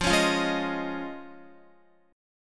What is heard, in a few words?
F Minor 7th Sharp 5th, Guitar; Fm7#5; strummed; x,8,11,10,8,10; 4E,4A,5D,5G,5C,6F